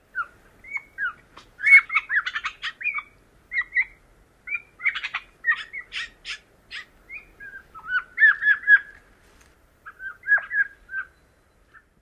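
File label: Gray Jay whining whistles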